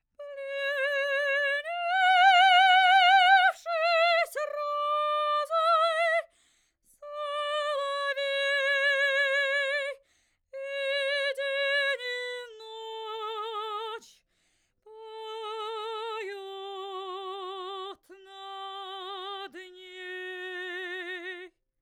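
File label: domra, Neumann TLM170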